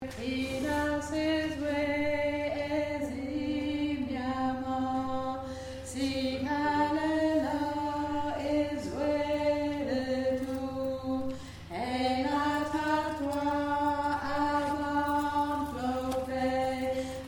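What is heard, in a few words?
alto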